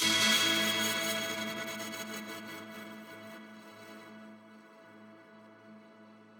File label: Pretty Hit A Maj 150; fx; base pack